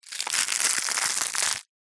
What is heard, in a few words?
food egg shell pieces crack break crush; zapsplat